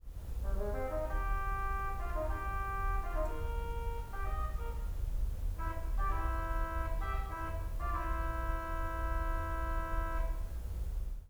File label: oboe horn